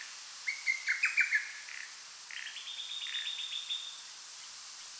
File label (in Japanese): hototogisu